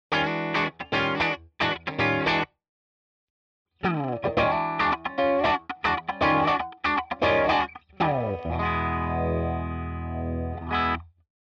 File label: Walrus Fundamental PHASER Light